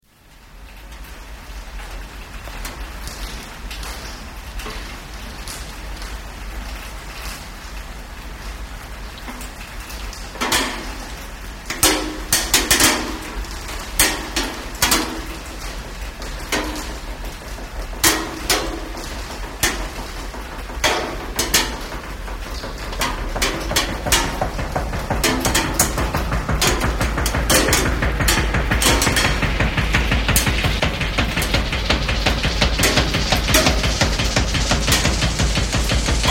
big band rain solo, Have you ever listened to rain pounding against a roof or